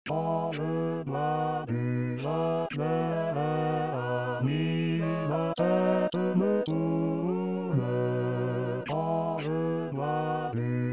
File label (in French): basse voix; l harmonieux vigneron